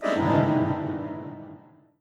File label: Accordion